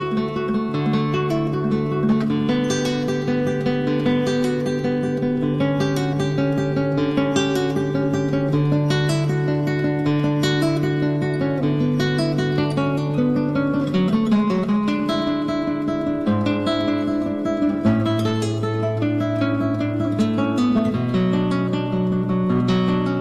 Cello Suite No. 1- Prelude

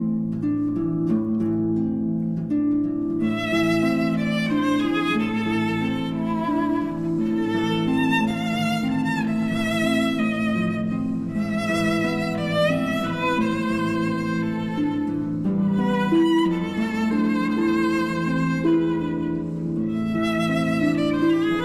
Berceuse, for violin and harp

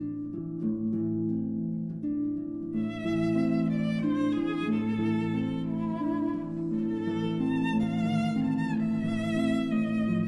Berceuse, for violin and harp

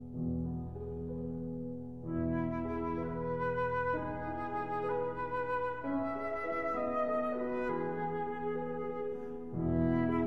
Berceuse (Lullaby) for flute and piano

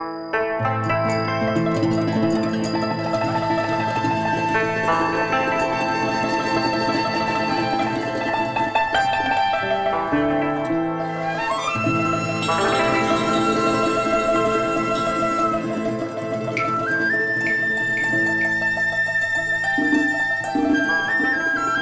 TRADITIONAL Chinese, Picking Flowers

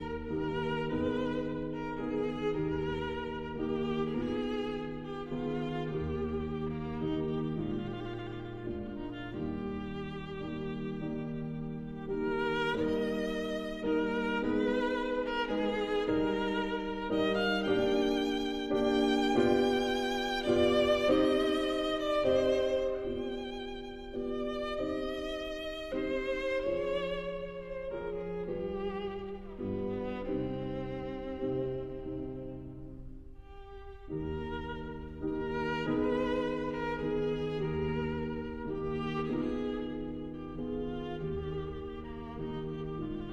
Sicilienne for violin and piano

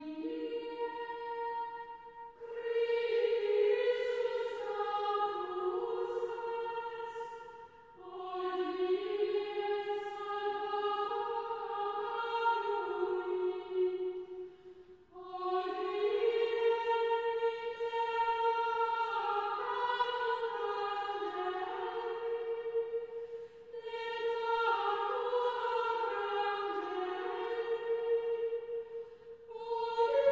Chant Antiphon; Traditional; Chester Processional

